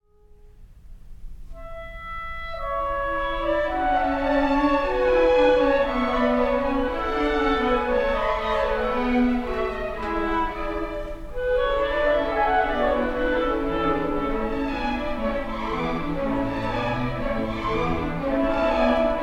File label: Clarinet Concerto; Excerpt